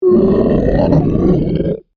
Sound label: Monster Groan, Horror, Monster, Groan, Creature; Monsters